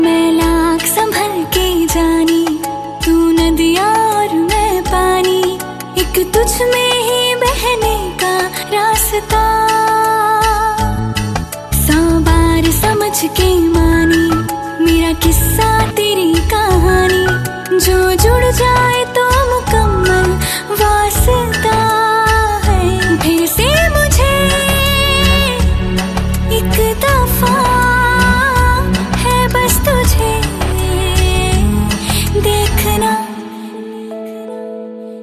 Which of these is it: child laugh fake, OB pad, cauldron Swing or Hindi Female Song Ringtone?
Hindi Female Song Ringtone